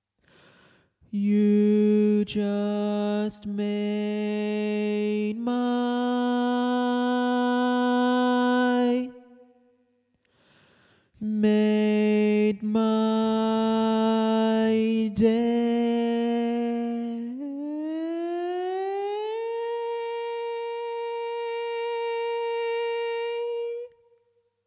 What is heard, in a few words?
Made My Day, Bari, barbershop tags